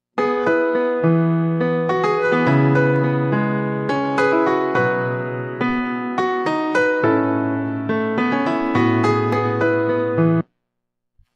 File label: RX2 Test Music BAD Repaired